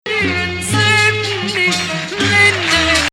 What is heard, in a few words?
Nahawand, maqam; Rast